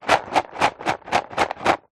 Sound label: dominos wooden in card box shake